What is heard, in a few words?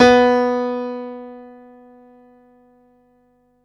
PIANO B2; Best Service Alpha Dance vol.2